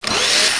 saw slash; Vaas

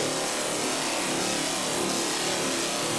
ng7 idle, weapons

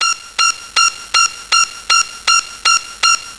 mine warning, weapons

